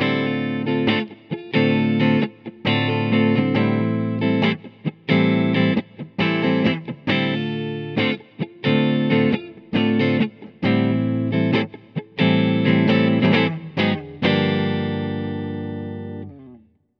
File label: Tesla Neck Humbucker Clean; Tesla Pickups vs. Roswell